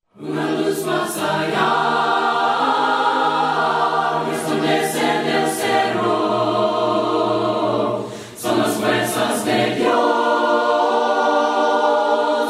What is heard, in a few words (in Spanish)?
VOCES; EL EJERCITO DE DIOS